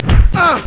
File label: BEATUP